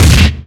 Hit Punch

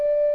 Notes Ocarina D loop; Zelda; OOT

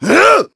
Kick; Ghirahim kicking Groose aside; zelda sounds; Skyward Sword

